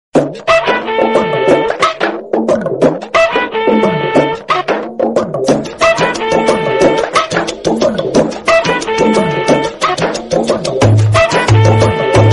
tamil bgm ringtone

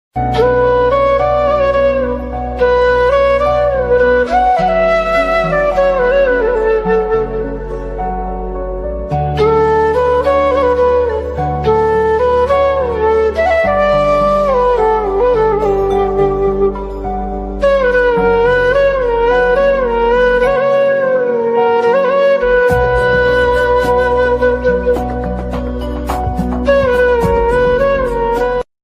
flute ringtone instrumental music ringtone; radha krishna

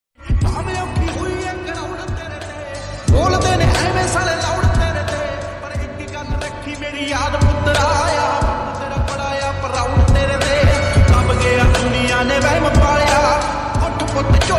ringtone slowed reverb

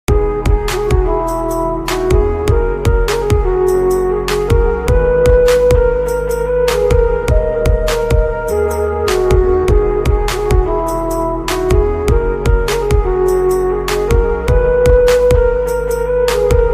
remix

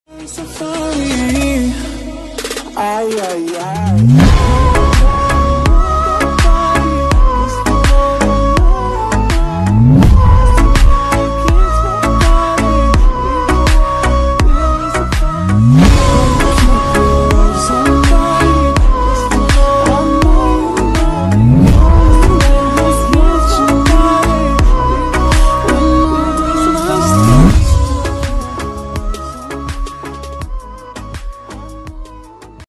remix ringtone; ncs

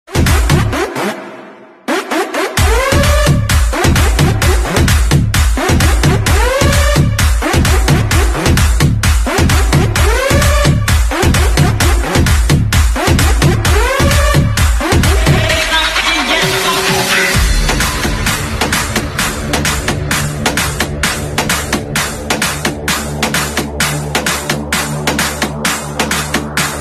english ringtone attitude ringtone

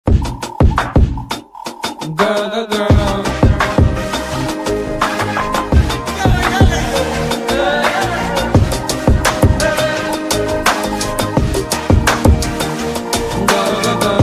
tamil bgm

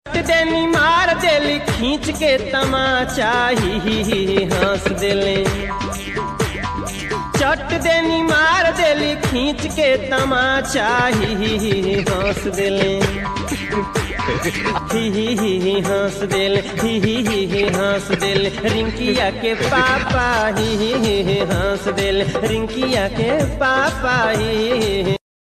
bhojpuri ringtone

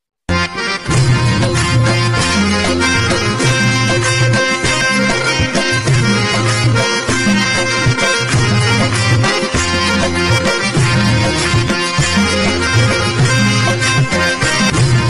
instrument music ringtone

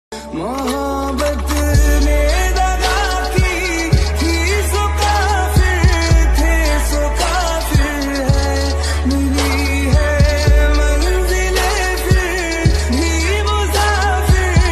sad song

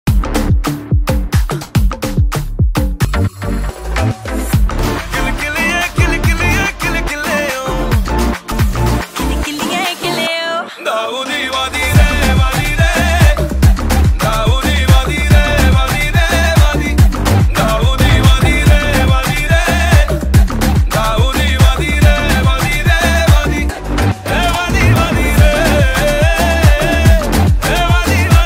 epic bgm ringtone

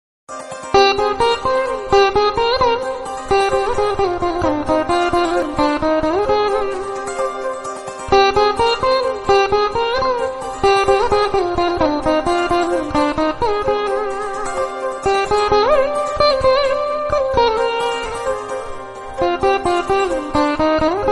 vina ringtone theme ringtone vina version bgm; saraswathi's vina; magili theme

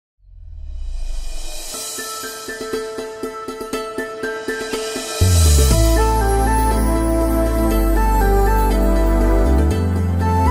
bgm ringtone background score

